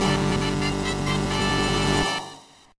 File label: Win; MPA; Duel Dash